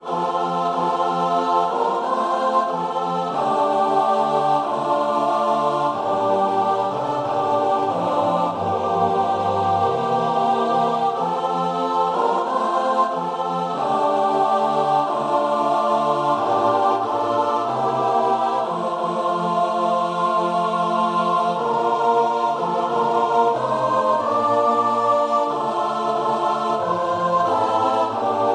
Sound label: CHOIR